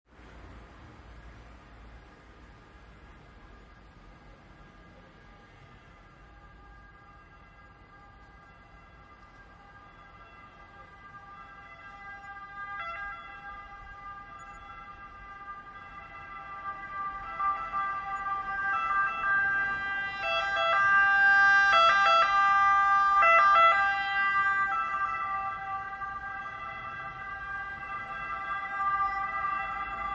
Ambulance Siren

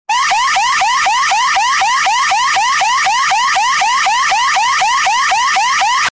emergency siren

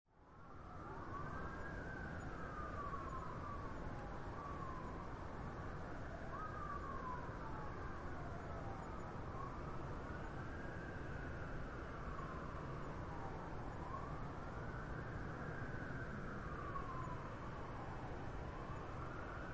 distant sirens urban night quiet roof

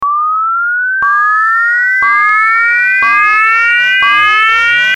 boing boing

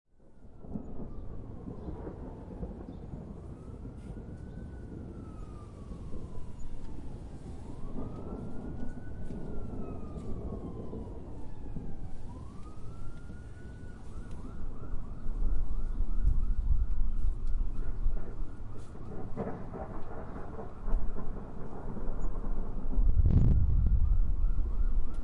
thunder sirens wind and rain

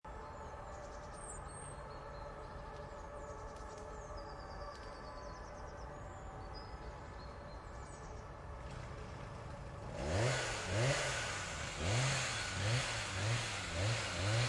field recording chainsaw in the forest; recording with zoom h2n

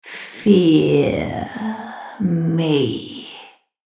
female horror voice siren

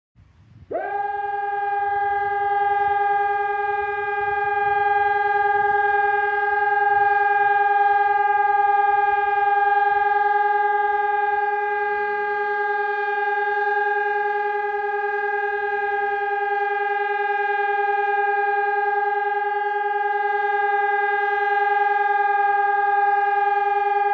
luxembourg eas alarm real

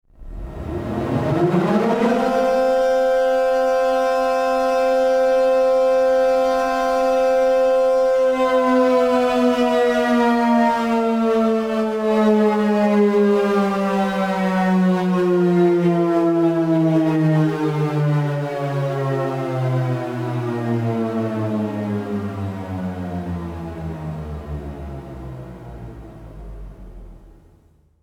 loud and alarming air raid siren sound effect

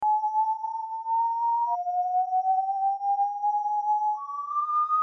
Police Siren

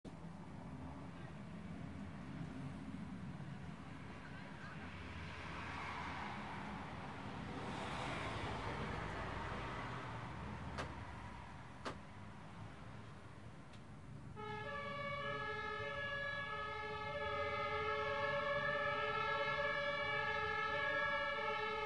german siren car driveby multiple ambience

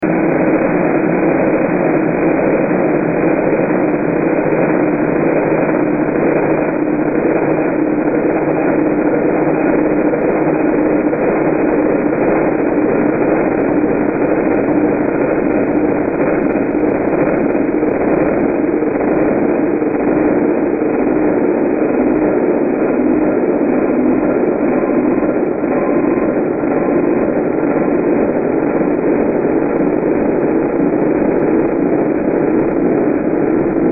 warbling

